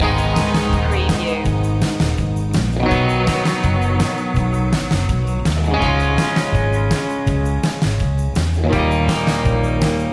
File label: surf loop